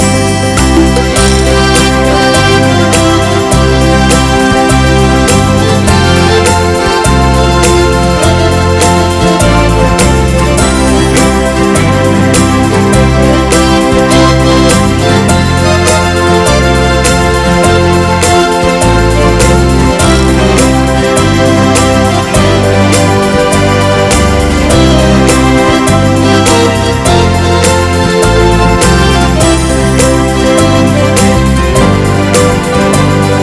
Smooth and Slow

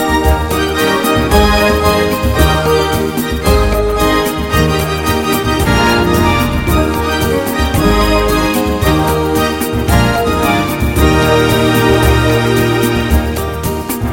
A Hot Ballad

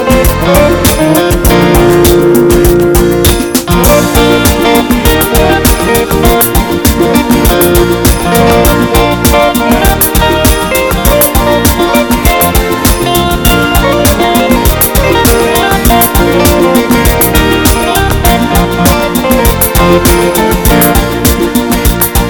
A Very Pretty Pop

